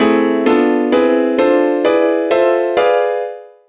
Aハーモニックマイナーコード 4和音

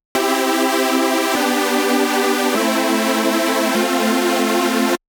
シンセ

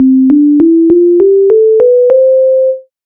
アコースティックギター

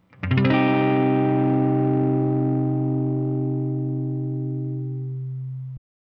マイナートライ

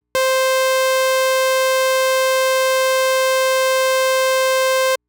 SAW波形

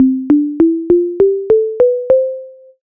木琴

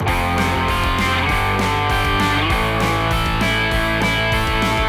アレンジテクニック アルペジオ

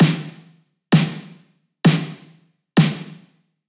MS処理 リバーブ

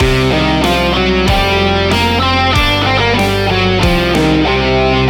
マイナーブルース

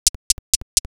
ハット

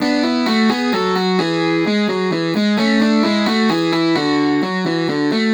カウンターメロディ

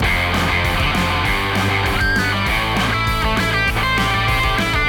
アレンジテクニック オブリガード

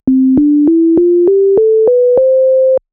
オルガン

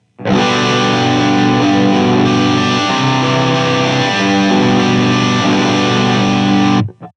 ma アルペジオ

